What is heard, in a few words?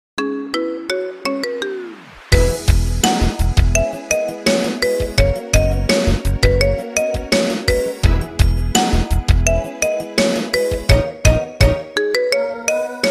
song marimba ringtone remix; deadpool 3; iphone